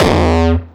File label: bassdrums, techno; single-sounds; single-drums